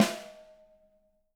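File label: 6X12 ALUMINUM; Living Drums; Sampleheads; Peter Erskine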